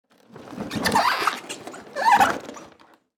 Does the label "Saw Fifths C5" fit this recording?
No